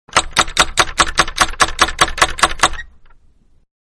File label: Knocker On Wood Door, Outside; Miscellaneous Doors; Manhattan Production Music